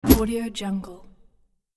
medieval chain hit

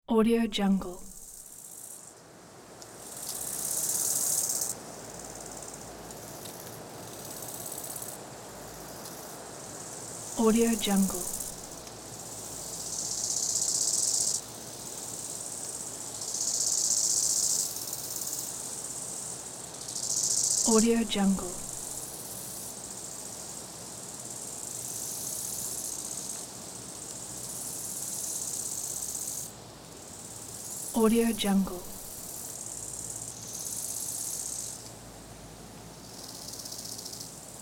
summer day ambience

